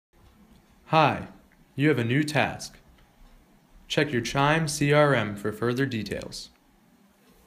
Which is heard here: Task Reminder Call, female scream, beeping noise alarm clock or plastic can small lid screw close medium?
Task Reminder Call